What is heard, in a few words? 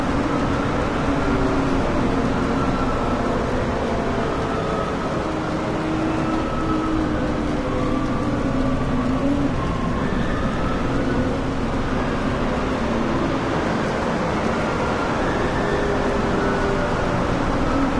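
movement loop; hades; smite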